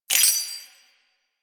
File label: Step Spur, Footsteps; Enemies; Foley; Warframe; Duviri; Dax